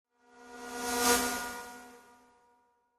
Static Buzz By Thin, passing elements

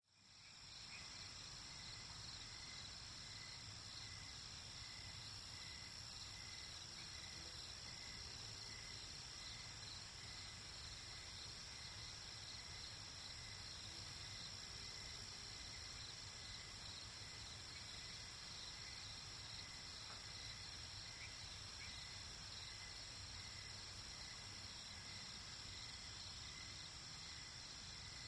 Birds Sporadic Frogs, cicadas

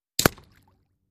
Bullet Impacts Water

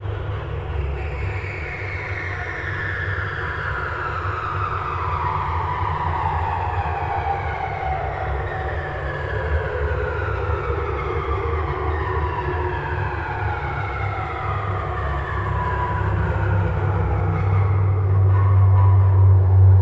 engine rev down, engines

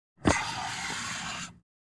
Pneumatic Door Mecha

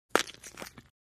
Bone Break

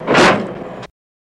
Metal Scrape Cut Off, ransack room

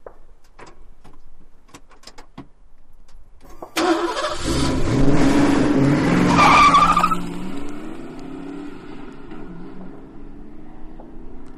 Mustang Strt Peel Gas

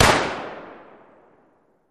Gun AR-15 Single Shot Fire

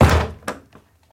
Library Door Close; latches & bolts

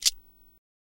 Knives Land Snow

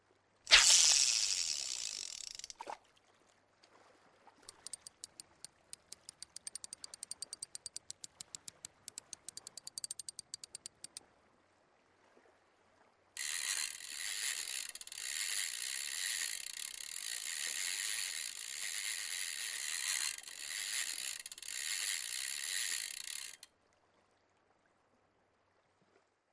Fishing Sequence Cas, fishing pole

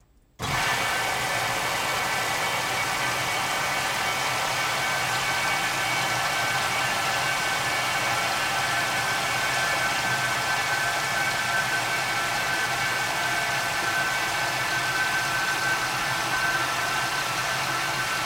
Wash Machine Fill Up

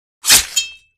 Sword Stab Through Ch

